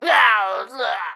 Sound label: Pecheneg Red Death